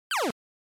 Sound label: NES General Pitch